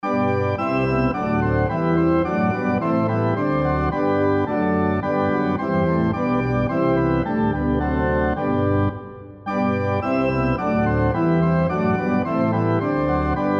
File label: SATB on Piano Example